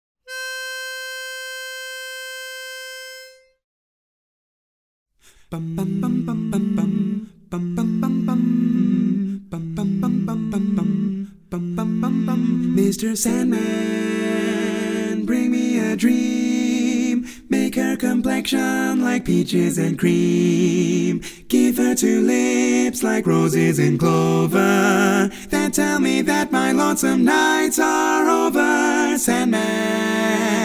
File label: Bass missing